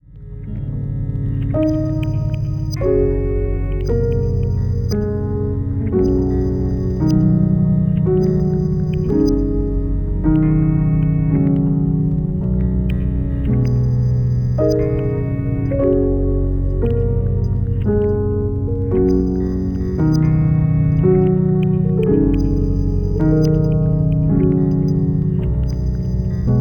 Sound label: Waltz